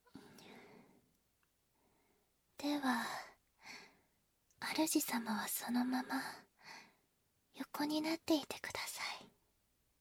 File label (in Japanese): 乳液マッサージ・キス, ASMR